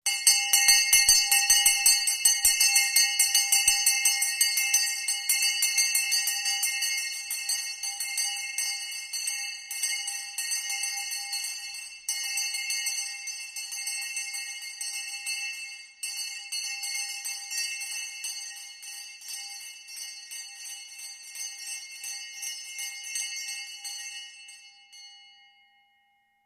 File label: Bell Hand Bell Being R